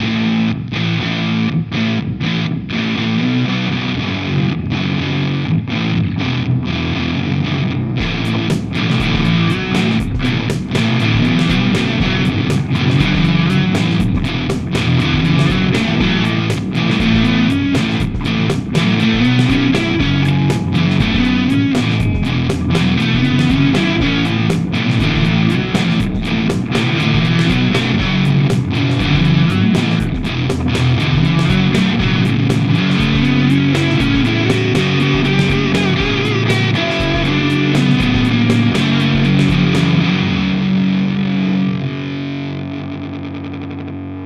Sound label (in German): Humbucker Steg; Beetronics Fatbee